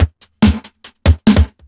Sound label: ELECTRO; USB Soundscan vol.25; Big Beat Drumloops; AKAI